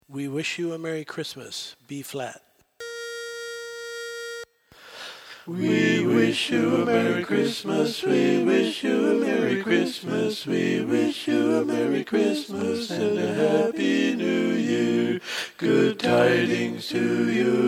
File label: We Wish You a Merry Christmas Bass